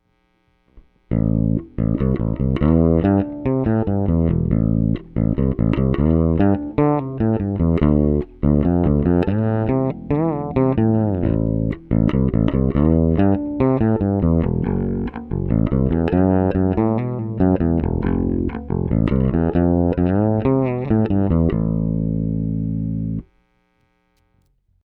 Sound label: four string fretless semi hollow